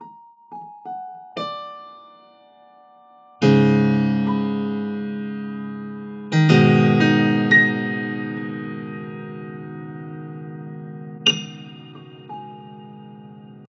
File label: Pianoteq; Flstudio